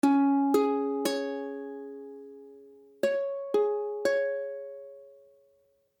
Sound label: Cis, ukulele; C# akordy (=Db akordy)